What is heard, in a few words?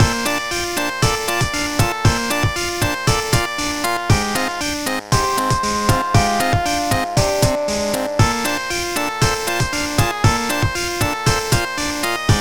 Living Waterway (Internal Percussion)